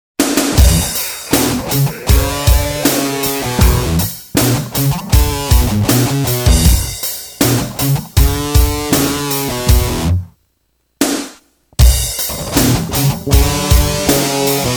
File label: Jam Session; Soundzabound Volume 4